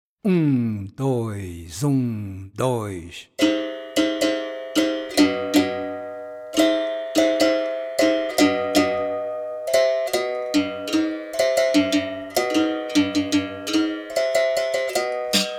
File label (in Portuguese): 2 Berimbaus Pandeiro; Bênção